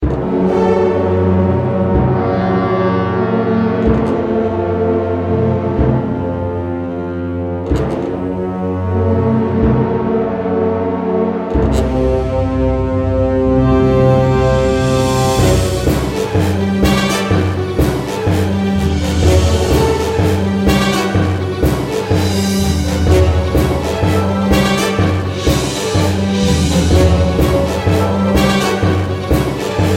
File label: Hordes March (boss - army)